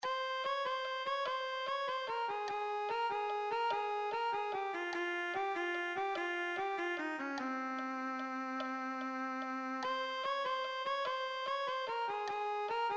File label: cello